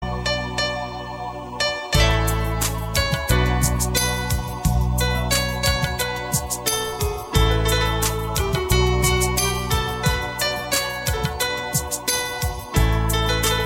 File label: Ringtone